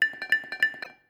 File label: leave track sound